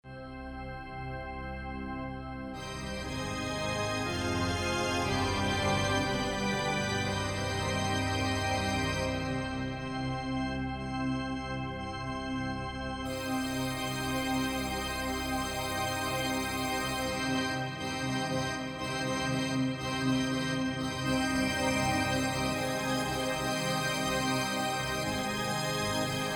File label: contrived church organ